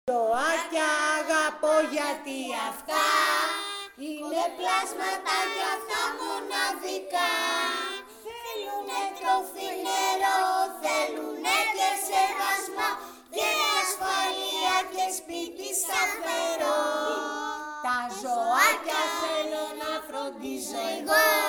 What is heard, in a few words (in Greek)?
Τραγουδάκι για τα ζώα